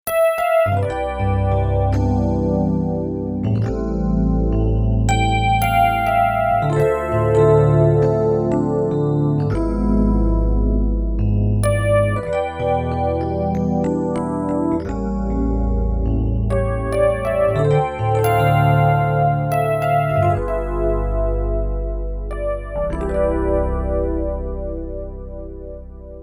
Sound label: Rhodes